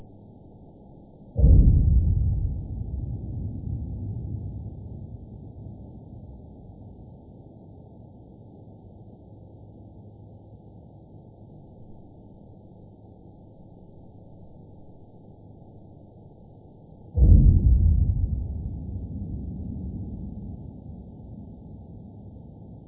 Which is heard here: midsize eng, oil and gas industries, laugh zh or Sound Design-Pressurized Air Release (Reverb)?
oil and gas industries